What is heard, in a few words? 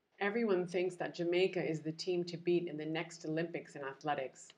beat jamaica